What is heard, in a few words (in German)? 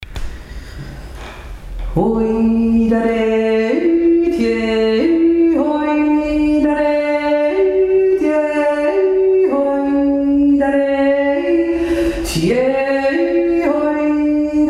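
rabrett 1 stimme, jodler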